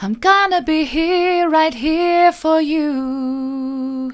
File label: soft, vocal